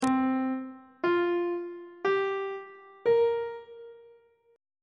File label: d double flat dominant 7th suspended 4th chord intervals; treble clef